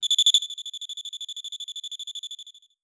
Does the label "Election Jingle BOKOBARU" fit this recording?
No